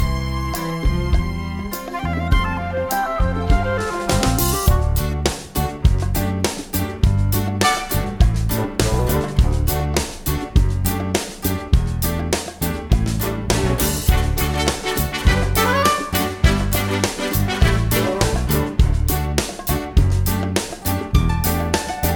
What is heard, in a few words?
accompaniment